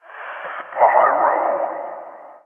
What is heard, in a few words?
whisper; Spyro